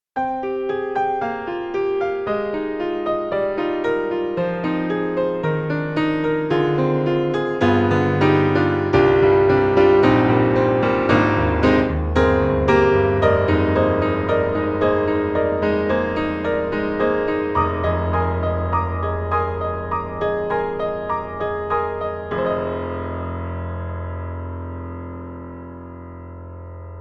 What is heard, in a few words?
Yamaha C7 Recital; Steinberg; The Grand 3